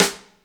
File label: SIDESTICK; Instruments & Percussions; InVision Interactive; Lightware VOL-1